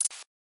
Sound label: glitch